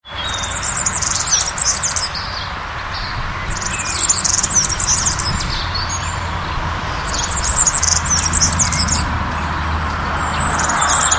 girlitz

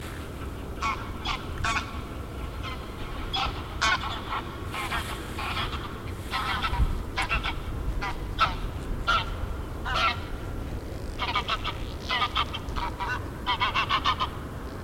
graugans

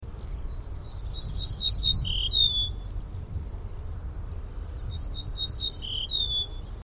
ortolan

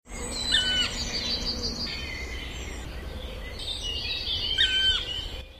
schwarzspecht; Dryocopus martius; Spechte

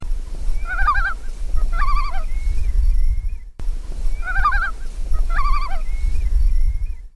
eistaucher

